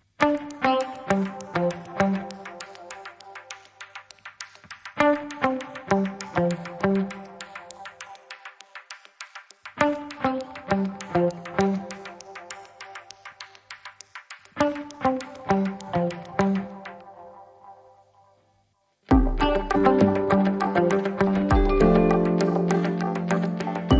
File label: Insightful Reflections (Neutral)